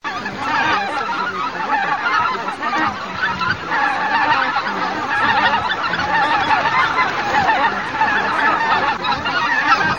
Белолобый

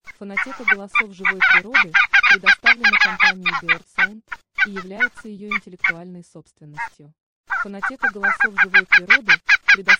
Казарка б щекая; OCA FACCIA BIANCA

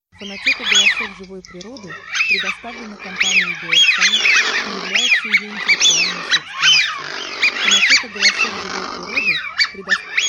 Свиязь; FISCHIONE

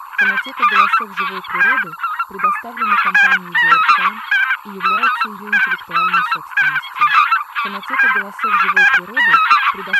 Белолобый; OCA LOMBARDELLE

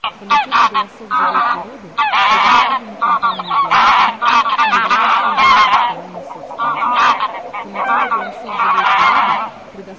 Гуменник; OCA GRANAIOLA

